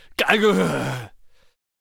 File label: Death Vocals; Gunslinger